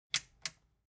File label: metal button click power on slow